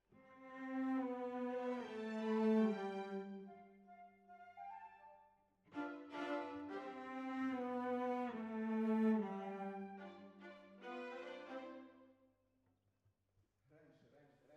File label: Cellos